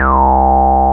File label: BAS, Eurotech; Sounds Good